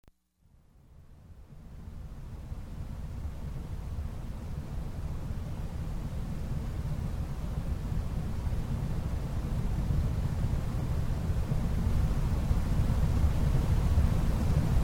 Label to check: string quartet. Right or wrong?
Wrong